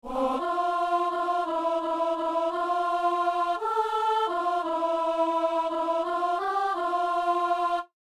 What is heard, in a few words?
alto; Psaume 70 (71); Messe du 17 janvier à Saint-Denis